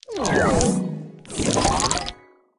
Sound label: Shuffle; Candy Crush Soda